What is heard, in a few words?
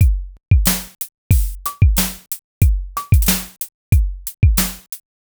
rnb drum loop 92bpm